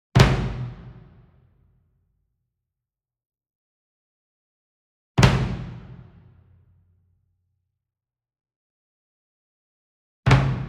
SINGLE DRUM Bass Drum Marching Flam Hard Beater Medium; boom library; Cinematic Strikes Construction Kit